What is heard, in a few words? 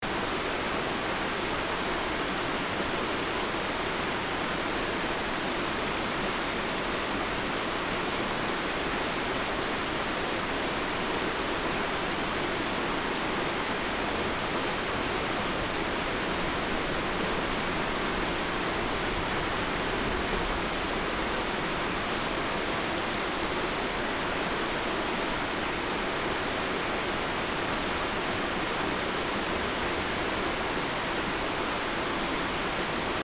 River Ambience Binaural